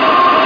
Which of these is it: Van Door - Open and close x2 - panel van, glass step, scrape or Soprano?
scrape